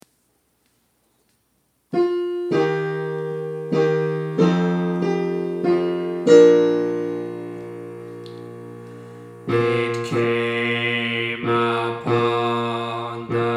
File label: Bass